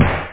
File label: high, snare, drums